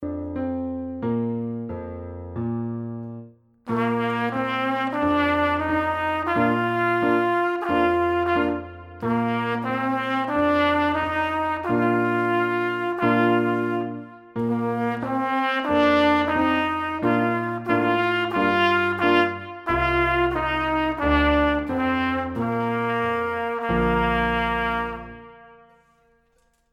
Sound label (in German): Trompetenfibel Ist ein Mann in Brunn gefallen